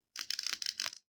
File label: arachnid laugh; Voice